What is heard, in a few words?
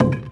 brick